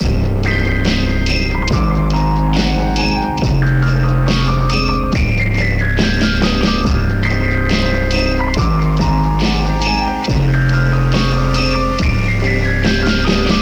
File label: Success